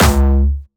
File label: C#2, Jumpstyle Kick; Vengeance Samples; Bassdrums; Jumpstyle Multis